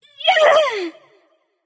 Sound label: grunt lift light; smite; voicepack; hel